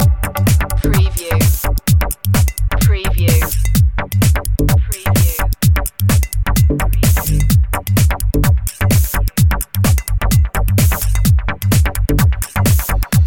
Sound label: particles loop